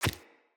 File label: Pack Wolf Step Single; Animals; Footsteps